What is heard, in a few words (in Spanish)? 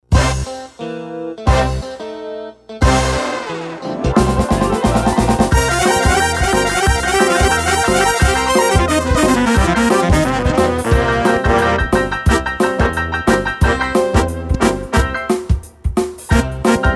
ROCK PISTA SIN FLAUTA